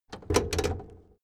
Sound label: car camaro open hood